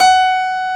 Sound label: Big Band Fast; Roland L-CDC-01